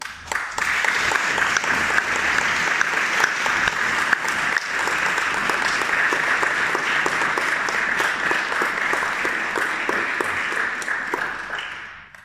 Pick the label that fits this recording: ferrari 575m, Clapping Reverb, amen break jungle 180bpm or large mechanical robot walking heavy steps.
Clapping Reverb